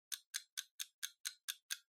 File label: Kitchen Timer Tick Short